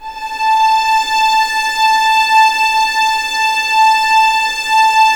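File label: A5 legato mf violins, left; East West; The Ultimate String Collection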